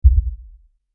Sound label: Lfe Hit; Hits; Sound Design; Production Element